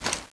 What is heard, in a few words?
cloth; PD2 common